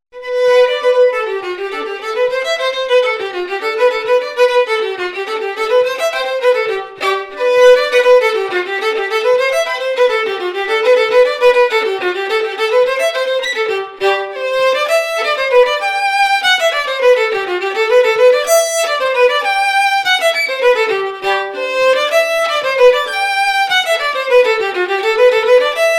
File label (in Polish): oberek